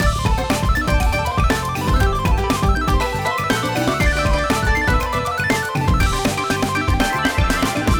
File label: big Win Loop